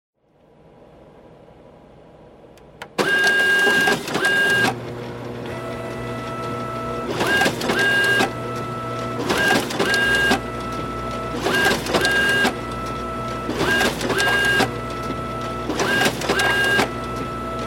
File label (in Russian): zvuki raboti skanera skaner kopiruet